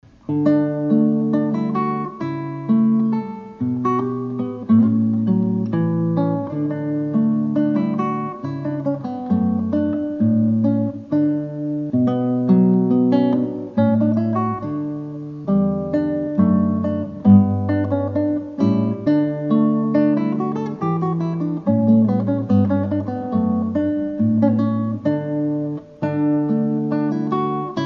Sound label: Guitar